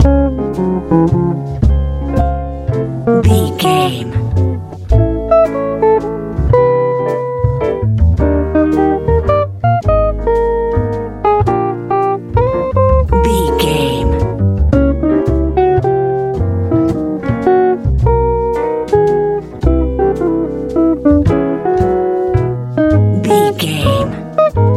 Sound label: warm happy easy jazz